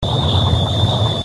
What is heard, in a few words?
Light Projectile Cavitation; Soundproof Walls 2.0